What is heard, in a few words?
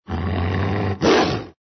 ANIMAL WEREWOLF